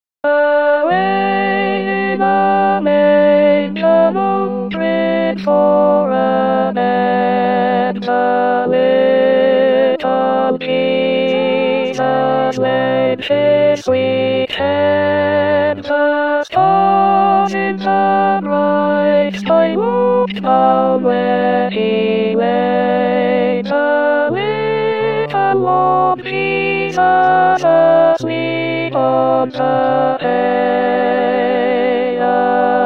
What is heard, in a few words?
Away in a Manger Alto